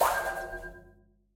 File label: Lab Giant robot Shot; Super Mombo Quest